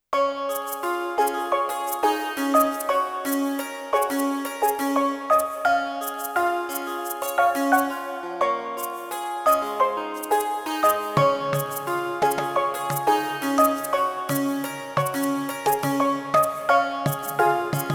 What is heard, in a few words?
(Tropical Vers.); Finding Paradise Soundtrack